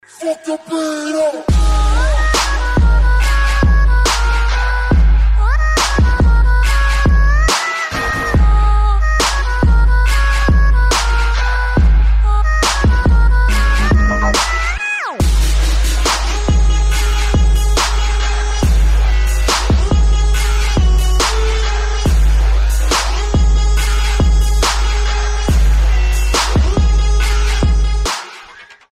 Arabic trap

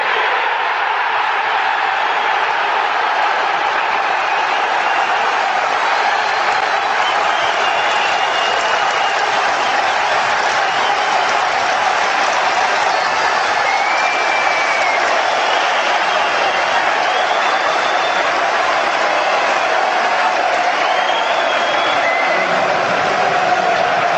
crowd cheering

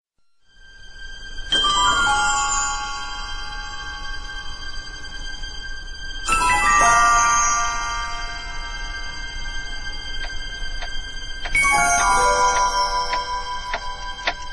opening piano; back to the future

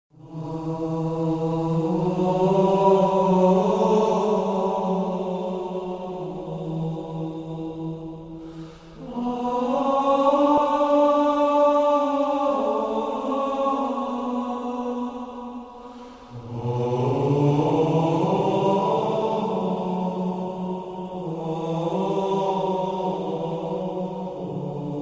gregorian chant; ringtone; halo

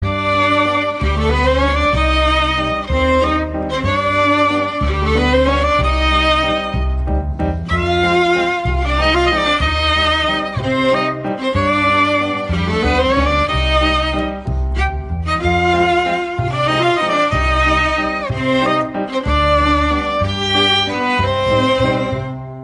waltz violin

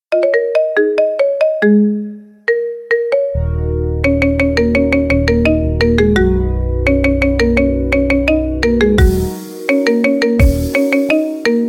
marimba remix

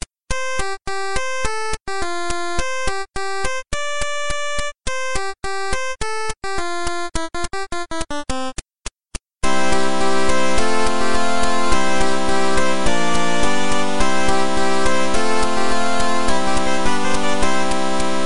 8 bit; ringtone; banjo kazooie

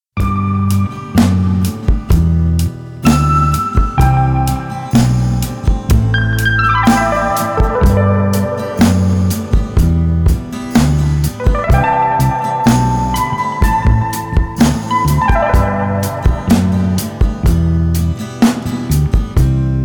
instrumental

